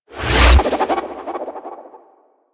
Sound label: Cast Start; Warframe; Bard